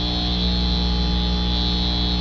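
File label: ambience buzz neon loop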